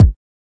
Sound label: kik